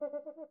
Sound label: cuica rubbing; angband